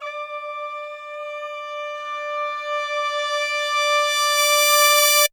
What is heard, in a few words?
BRASS CRES; Zero-G; Phantom Horns